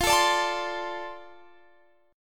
F 6th F

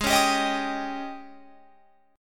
Ab Major 7th Suspended 4th Ab Guitar Open F; AbM7sus4 strummed; 20,20,19,22,22,22

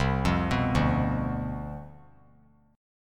C Major 7th Suspended 2nd Suspended 4th, chord; CM7sus2sus4 arpeggiated; JGuitar

